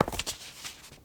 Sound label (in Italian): Leggio con libro; BLIND QUEST - The Enchanted Castle